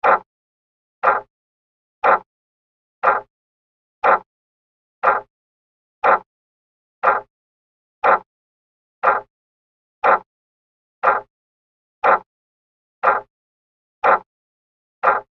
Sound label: household clock tick; Clock Ticking Close Up; Machines; McKinney Sound